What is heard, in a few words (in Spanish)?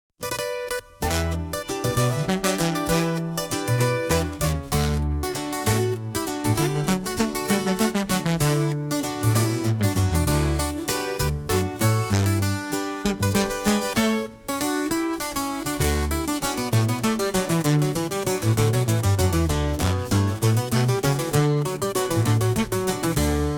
corrido